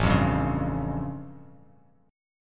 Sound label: A Augmented 7th A; strummed; JGuitar